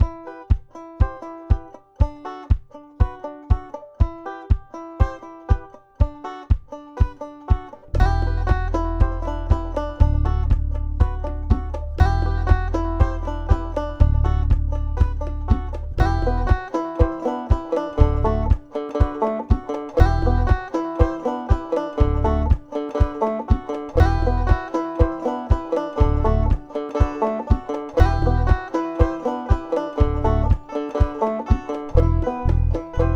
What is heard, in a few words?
banjo Trance